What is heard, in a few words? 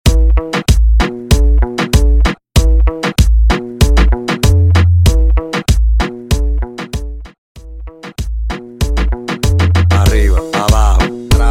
Medley 96BPM